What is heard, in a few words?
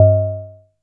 DULL, PERC HITS; Zero-G Ambient 1